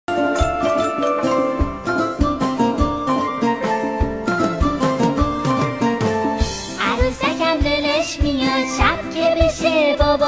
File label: aroosakam lalash miad, شعر کودکانه